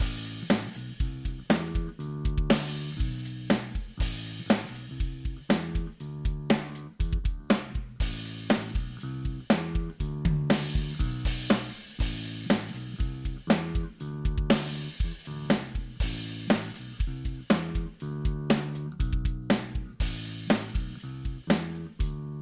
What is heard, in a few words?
riff